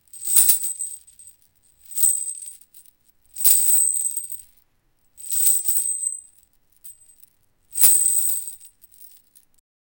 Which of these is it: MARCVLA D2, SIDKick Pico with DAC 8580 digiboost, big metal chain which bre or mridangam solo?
big metal chain which bre